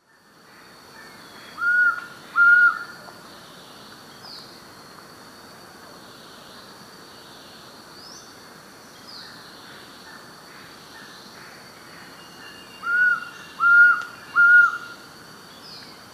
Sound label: Trogon rufus, song male; S. America; Eastern Lowlands